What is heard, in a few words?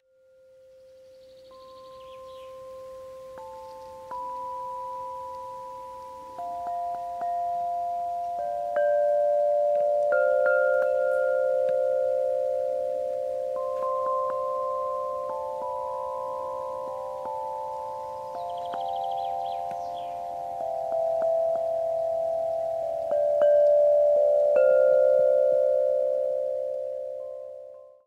Krystal Harpe